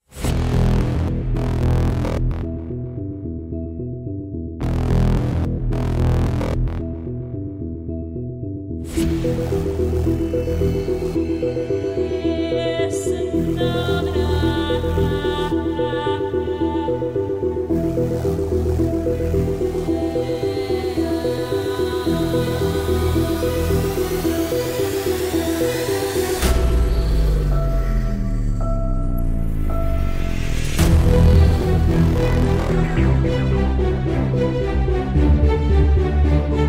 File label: Epic Powerful Dark Menacing Choir Electronic Battle